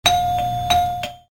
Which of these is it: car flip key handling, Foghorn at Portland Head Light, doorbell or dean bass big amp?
doorbell